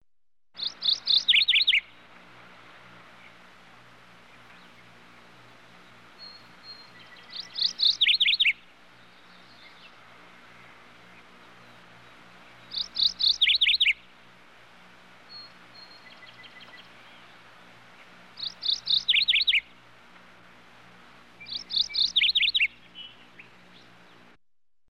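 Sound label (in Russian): разные птицы общая атмосфера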